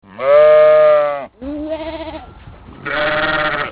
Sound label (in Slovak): ovca; zvierata; domace